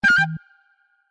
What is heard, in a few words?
Recorder; Samsung Galaxy S24